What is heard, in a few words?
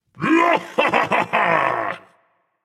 battle cry; smite; voicepack; ganesha